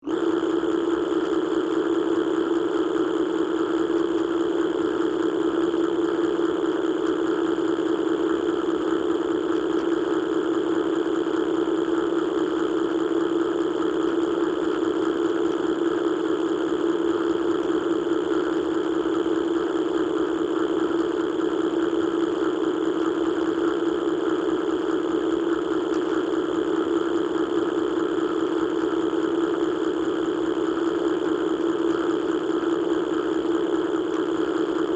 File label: Ambience Machine St